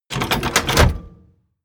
mechanical lever metal medium up

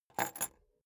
key ring small place table soft

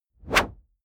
whoosh noise sweep hit short

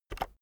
keyboard external enter press very fast soft

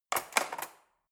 cutlery fork drawer handle scrape

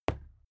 fight impact sweetener tiny

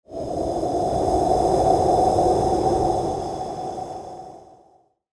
airy smooth transition

